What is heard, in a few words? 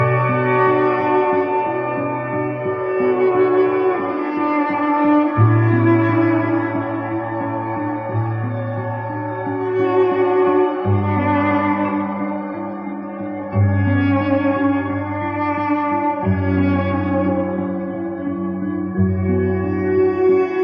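strings and guitar, sad loop; loops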